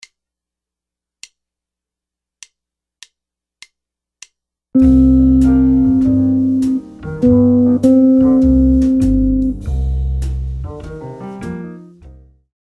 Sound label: lick, jazz guitar